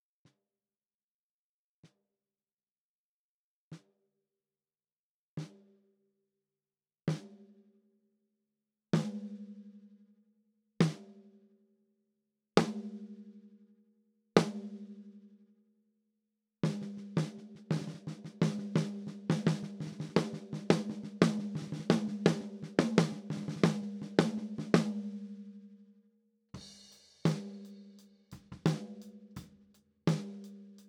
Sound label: SM-58 close top low, 13x6 ahorn